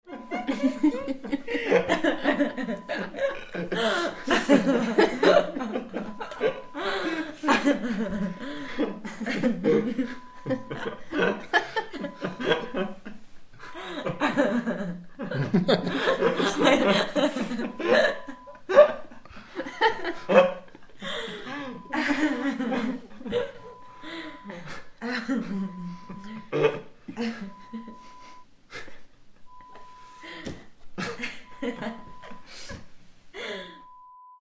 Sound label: Laughter, Small Crowd